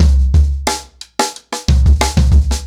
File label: 90BPM, ACOUSTIC DRUMS; Sonokinetic - The Roots Drumloops